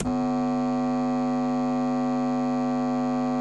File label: AMP HAM; 1000fx